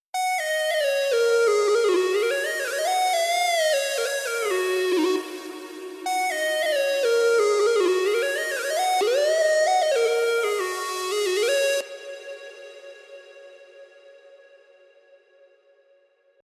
Anfangsmelodie

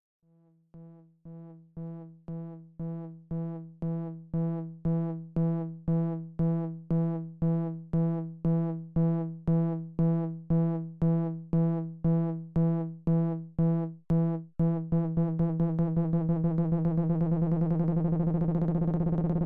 Geloopte Hüllkurve

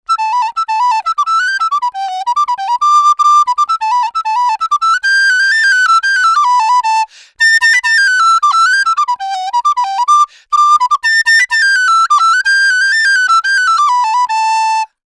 Flöte EQ

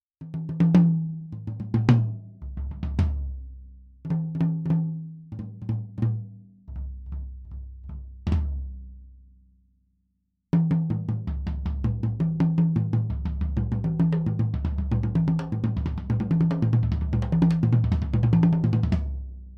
Toms MITTEL; sE Electronics V Beat

